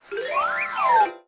Giant Bear Jump To Meter; candy crush jelly saga gamerip

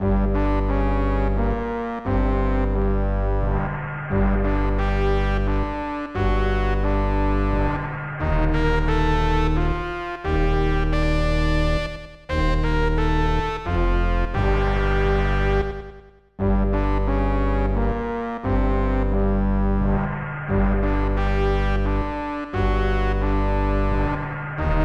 Horror; record of lodoss war 1995 snes

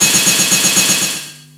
Magic Ice all

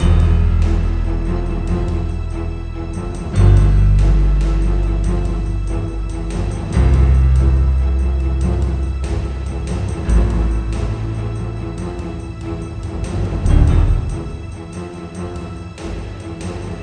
Loading Screen; SWAT 4

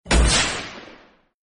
hell demon blast sound; Arc of Templar

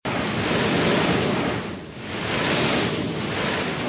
Zombie Jetpack Loop; City; Zombie Catchers

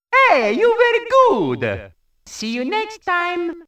jingle ~ the end

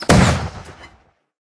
Bertha Shot; Level16

